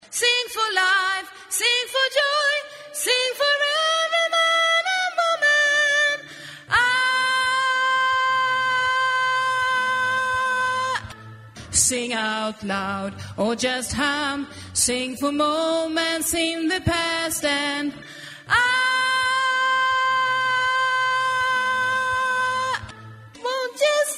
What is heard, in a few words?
tenor